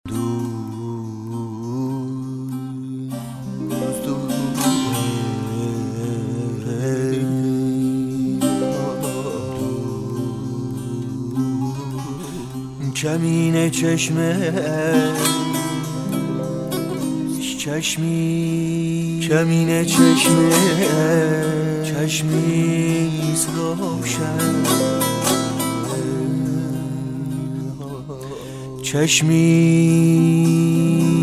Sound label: Avaz